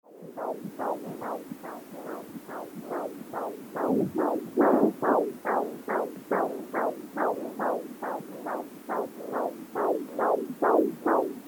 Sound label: doppler; akuutti